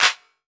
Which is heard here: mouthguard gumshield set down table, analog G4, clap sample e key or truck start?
clap sample e key